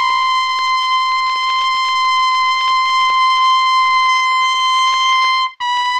horns; Phonky

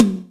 Toms, Real

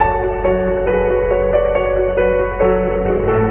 piano, Loops Sad

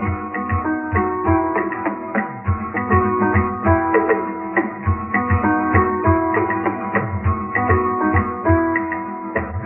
piano electronic toms, Loops; Phonky

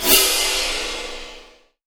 suspended, cymbals

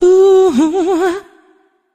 ooh hoo SUNG, Voice; ooh ahh

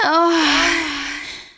female orgasm

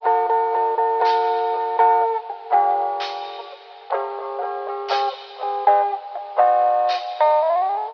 guitar, Loops Phonky

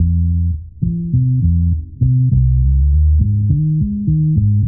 bass, Loops Phonky

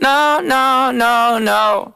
no no no no SUNG

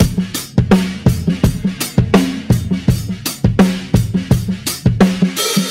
Boombap drum loop

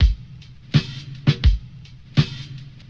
empty spaces, Boombap drum loops

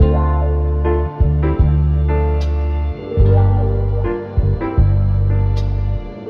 piano earnestly, Loops; Phonky

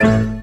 feathers, Synths; Notes

